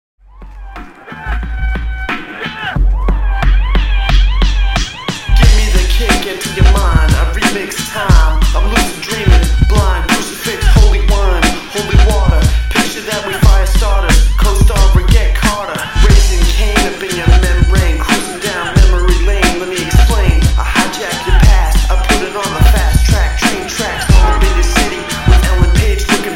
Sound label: Rap